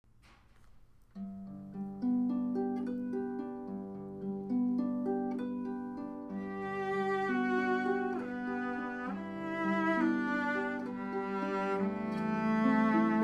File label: Cello and Harp Duo; The Swan